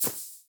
Generic Pickup; Objects; Pickups

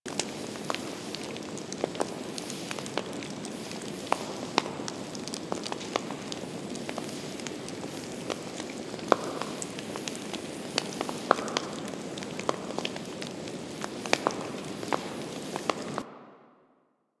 Candle Brazier Loop; Warframe; Clan Dojo; Dojo Props; Dojo Dagath

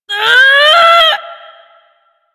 Ability Lift Laugh Lich

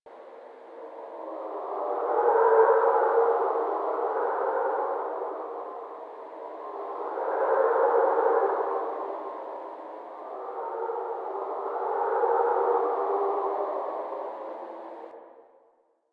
Interior Wind Howl Bed Loop

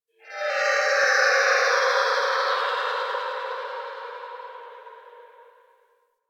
Exterior Tonal Textures, Ambience; Warframe; Rail Jack

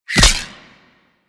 Ghost Expel Spirit Impact; Warframe; Priest Quest; Rell Ghost

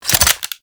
Submachinegun Reload; Warframe; Tenno

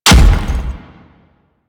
Thumper Bot Step Heavy A; Warframe; Grineer

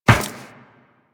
Run Metal Perforated, Footsteps; Warframe; Tenno

